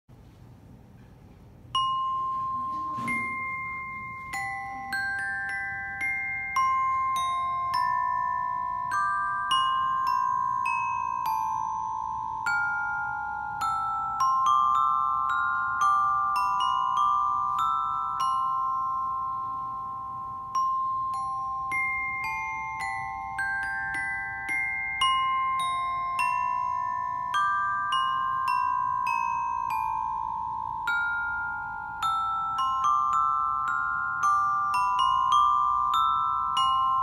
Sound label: Metallophone